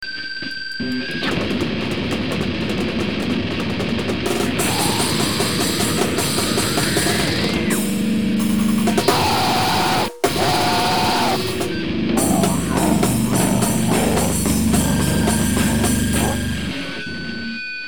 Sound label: NOISECORE